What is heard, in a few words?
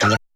vocal